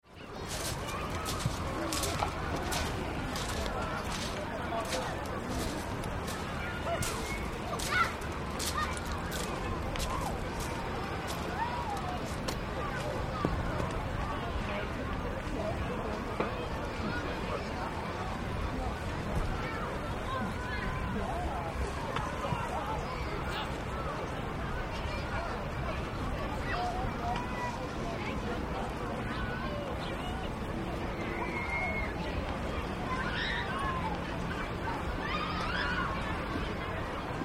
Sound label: Seaside Beach Atmosphere Mediums shot; Children and activity; Holidays; Tom Hutchings